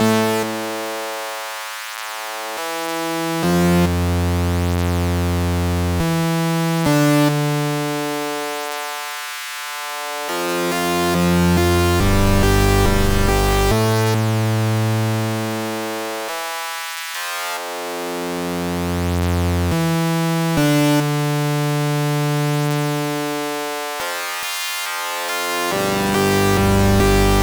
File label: SYNTH